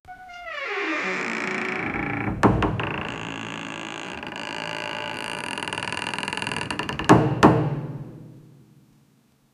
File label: room